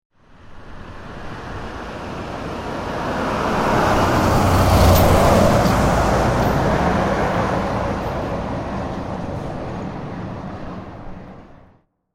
Tanker Pass, Heavy good vehicle passing right to left; Trucks